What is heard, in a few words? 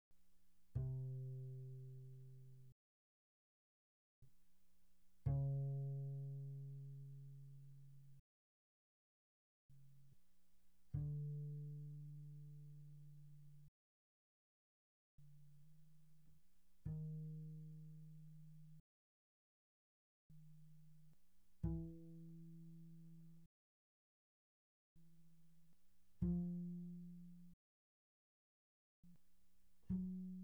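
Bass pizzicato pianissimo sul G C3 B3, doublebass; Strings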